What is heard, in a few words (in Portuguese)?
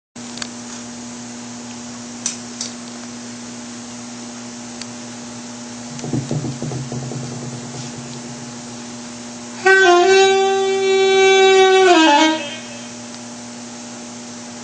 soprano solo